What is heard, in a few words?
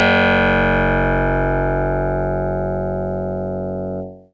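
Harpsichord DX100 F sharp 2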